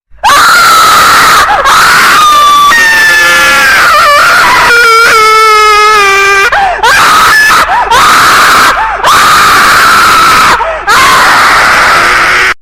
scream earape; tinky winky

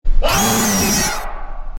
mouse jumpscare sound

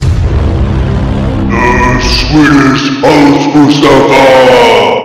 jingle

